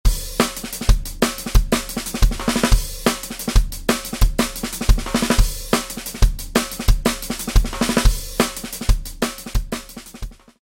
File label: Pork Pie with Sticks, snare; fxpansion snareselects